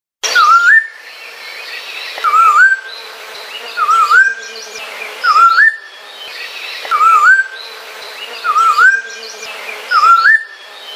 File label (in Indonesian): samho